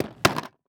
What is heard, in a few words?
First Aid Kit Metal Setting Down On Concrete; Emergency; AdobeCC